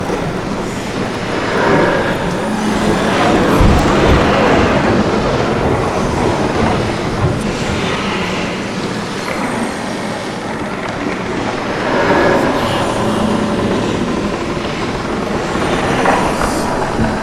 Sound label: magic tree moans loop